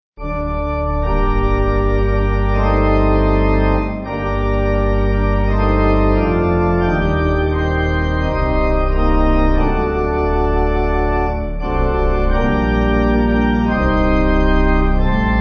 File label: Pipe